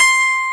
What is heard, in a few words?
YANG-CH'IN; AKAI S6000 CD-ROM - Volume 1